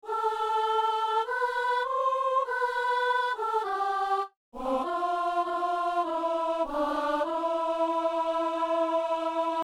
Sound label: soprano; Saint-Denis